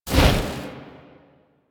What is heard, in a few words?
Fireball; Warframe; minigames; Caliber Chicks minigame; boss